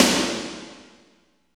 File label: SNR, Tekno Industrial; East West